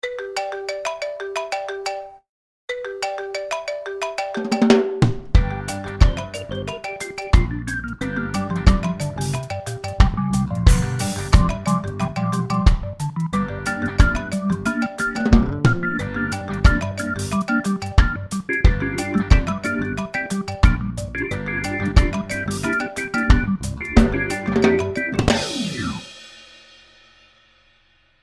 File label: iphone marimba tunes ringtone